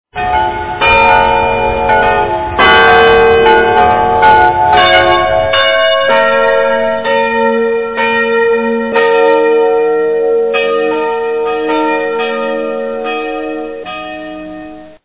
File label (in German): Kirche